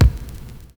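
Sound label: noizy bass drum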